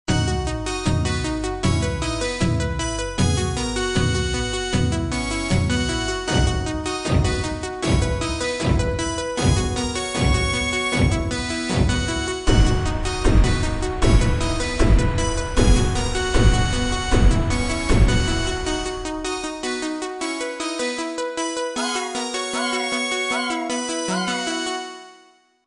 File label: techno pop